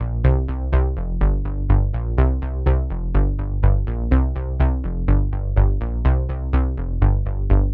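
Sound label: 124, SYNTH BASS LOOPS, C minor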